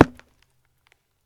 Arrow impact; bow

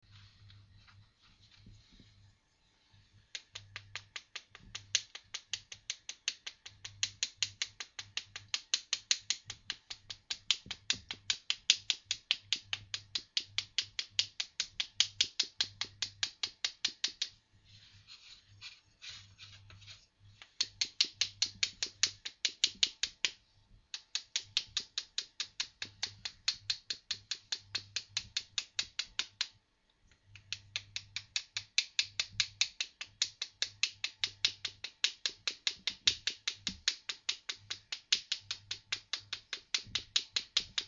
sifting flour

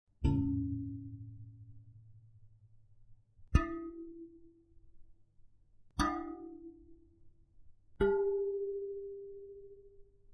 plang pleng pling

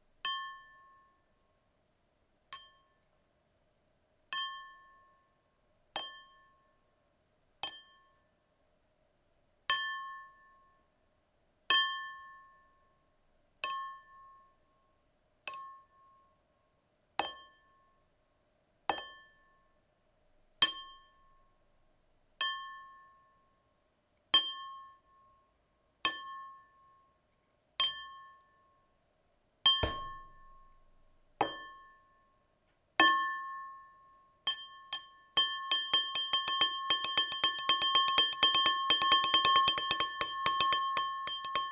glass bing

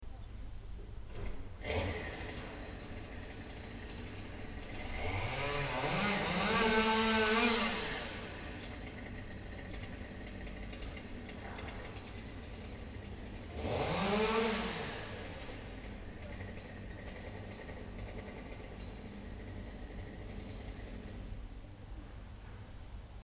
chainsaw

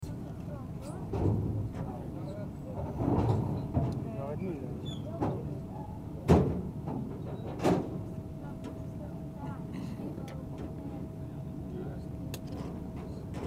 boat getting set to leave

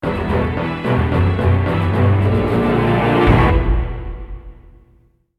victory; wesnoth

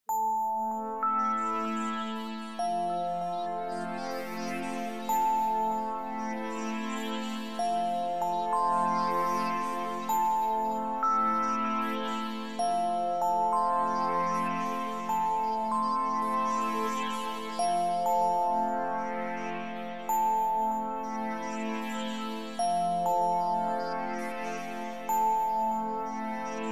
bells midi

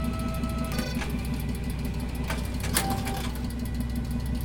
machine buzzing working

